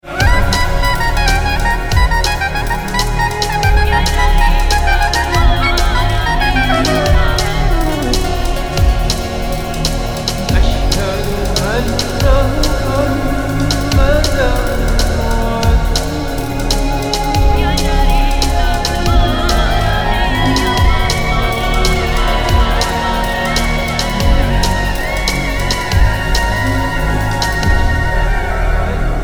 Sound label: Shaman's Trance